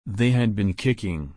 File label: kick